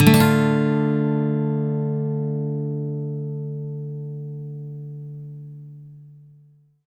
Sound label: MIN SWEEP D, Electric & Acoustic Guitar; USB Soundscan vol.04; AKAI